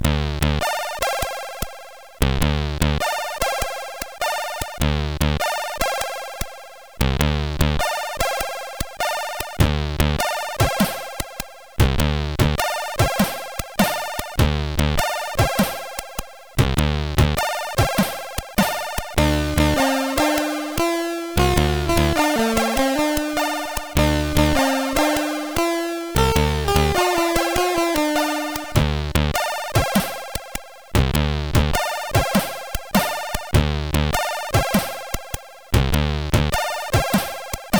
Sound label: Game Over CSG8580R5